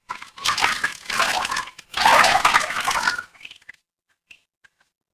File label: water swirl